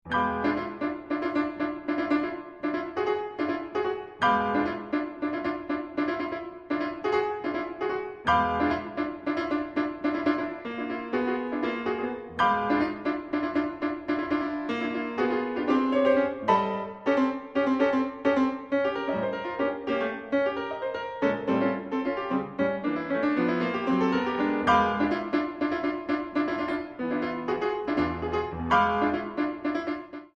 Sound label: blues